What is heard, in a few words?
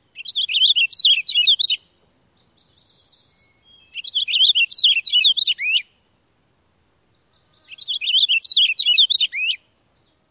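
song, purple finch; NY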